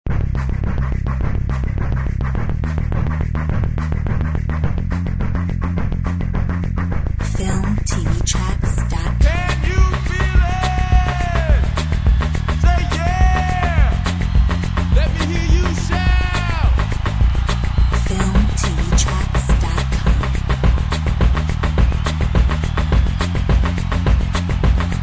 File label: Brazilian festival